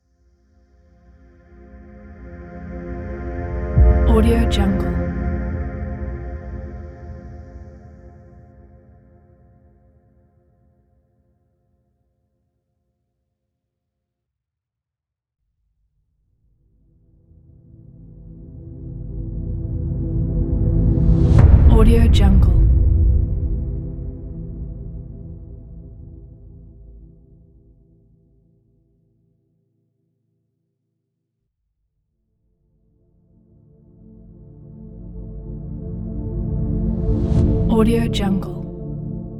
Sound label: epic pad rise